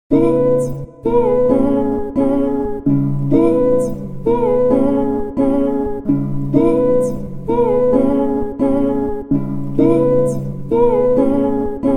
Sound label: Acapellas chill out 130 Dbm